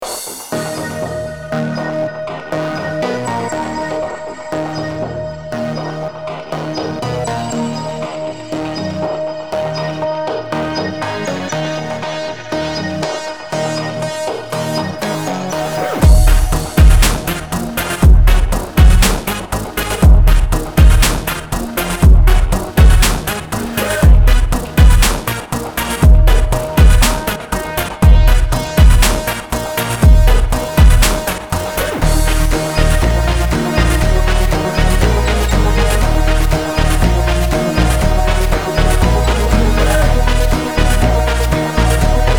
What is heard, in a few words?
Fat Accent; Zaplin